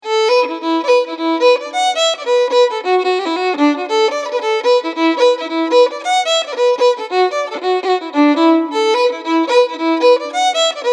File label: Jig; Killavil Jig